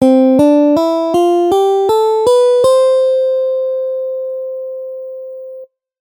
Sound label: C Major Scale